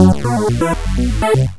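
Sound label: techno riff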